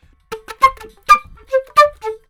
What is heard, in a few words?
Chill Pop Flute, Dm; Pop Rock